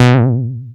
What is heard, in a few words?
Bass Juno H; Vengeance Samples